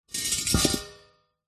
industrial gas canister metal movement scrape bash on gritty concrete surface